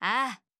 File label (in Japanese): ああ！